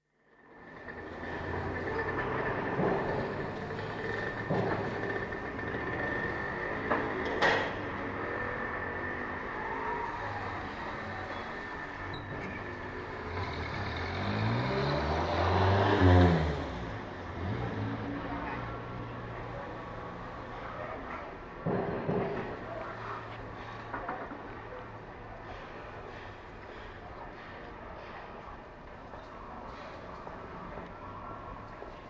Street, Zipaquira (village near Bogota in central Colombia) with traffic (occasionally heavy), footsteps, speech and roadworks noise; BBC